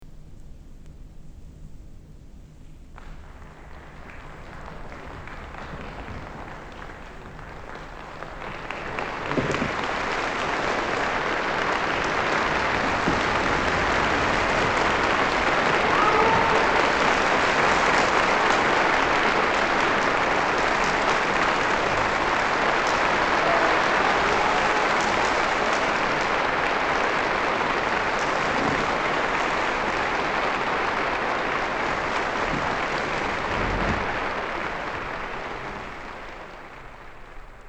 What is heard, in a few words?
clap; Anton Bruckner; Symphonie Nr.8 c-moll; clapping; Hans Knappertsbusch conducts; vinyl 12"; 33 rpm винил моно ремастеринг